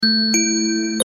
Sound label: beep